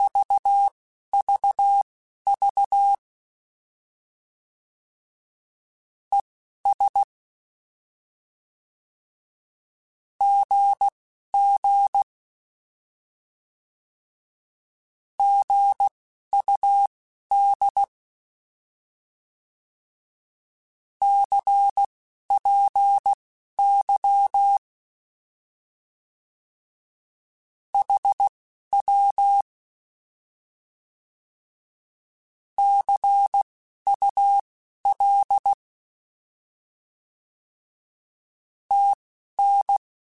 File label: Words, Morse Code; Mixed; HamRadio